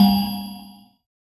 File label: dreamy sci fi jrpg menu; video game